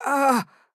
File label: Hurt; Garnet